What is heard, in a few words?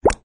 Token Match; Secrets of Magic: The Book of Spells